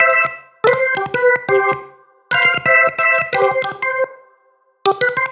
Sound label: Click Org; keys